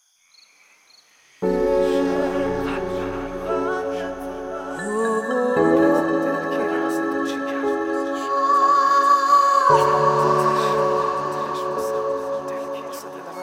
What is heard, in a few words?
PIANO VERSION